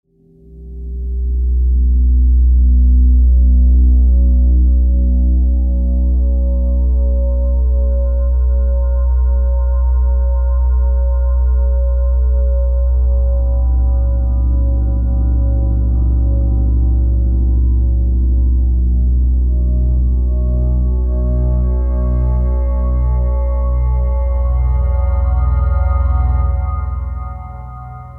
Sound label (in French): Gong, Orgue à feu